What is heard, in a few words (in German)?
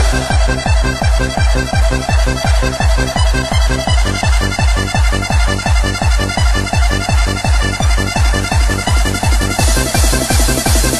Jingels